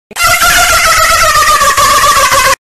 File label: amazing laugh; flightreacts